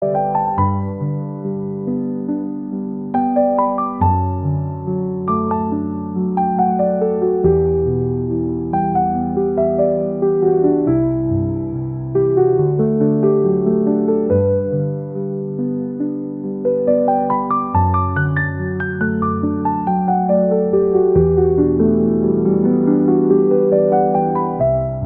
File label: Urban Music Soiree, bgm